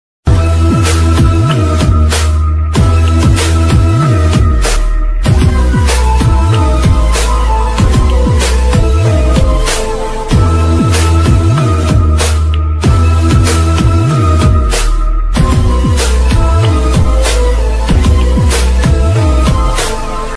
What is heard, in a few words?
dangerous villain bgm ringtone; new villain bgm ringtone; viral bgm ringtone; tiktok bgm ringtone